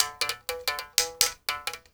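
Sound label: SM MOUTH BOW; Spectrasonics; Heart of Africa 2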